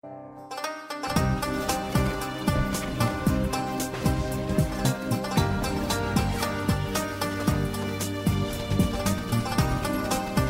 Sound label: sad guitar